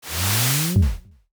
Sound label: horror shadow crossing